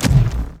HYDRAU; Best Service; Advanced Mega Effects

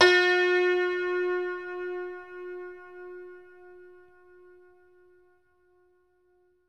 HACKBRETT F#2; Best Service; Ethnic Journey Sound Cube

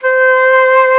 FLOTE, Alpine Volksmusik; Best Service

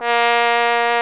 POSAUNE BASS B2; Best Service; Alpine Volksmusik

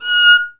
GEIGE; Best Service; Alpine Volksmusik

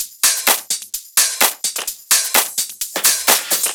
Drum Loop 128BPM FULL ohne BD

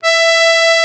AKK VIOLIN, akkordeon; Best Service; Alpine Volksmusik

